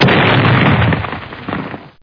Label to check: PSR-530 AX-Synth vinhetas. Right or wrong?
Wrong